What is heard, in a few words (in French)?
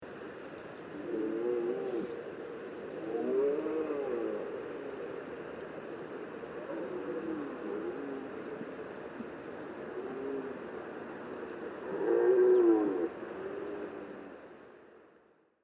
Brame